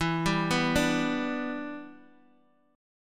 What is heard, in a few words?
E Minor 7th, down, Guitar, DADGAD; Em7; arpeggiated